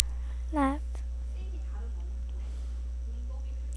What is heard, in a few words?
laugh